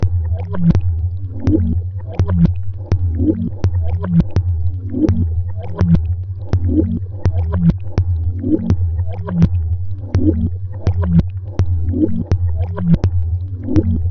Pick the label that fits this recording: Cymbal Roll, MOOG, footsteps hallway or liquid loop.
liquid loop